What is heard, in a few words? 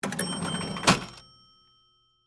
Cash Register Open, Industry, Cash, Register, Open, Drawer, Bell; SoundEffects-BlastWave